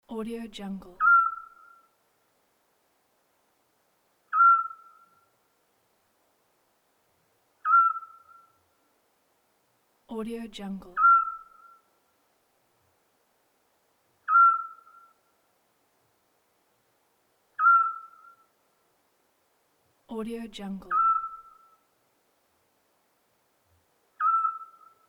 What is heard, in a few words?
little owl in night